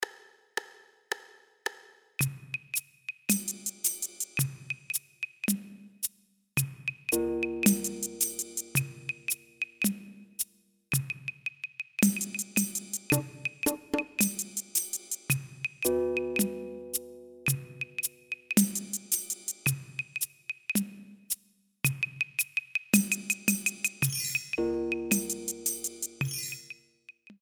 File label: drum for tap